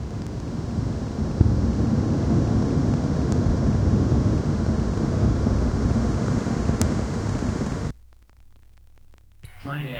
quiet urban night ambienc

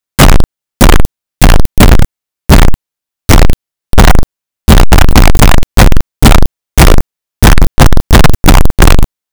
a foot in a soccer

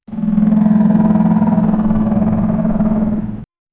introduce a low rising, heavy machinery

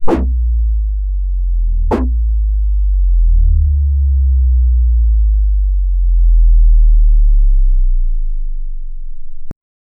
bass sound that slows

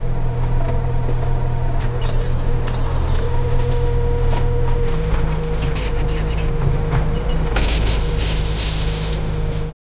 bus engine hum happy chat; snow crunch

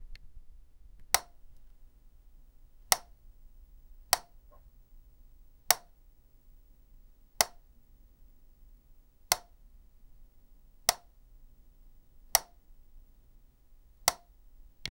a button gets on a, lighting

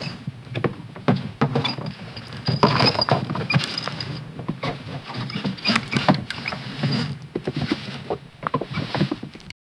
rhythmic squeaking of old; pine needles

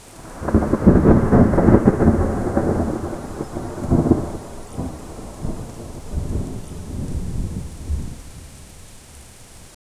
realistic thunder lightni; high detail

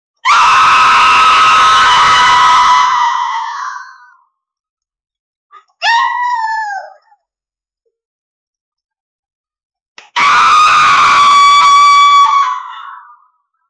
a scream sound and clap

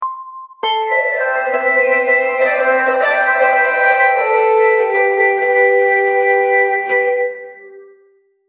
humor suspense

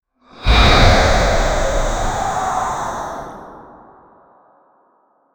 attack

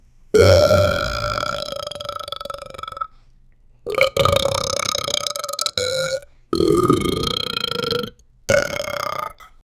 a girl with burping spasm; female

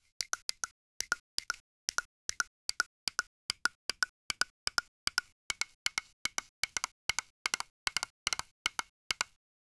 fast crisp taps made by, woodworking

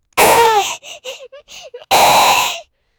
child gasp

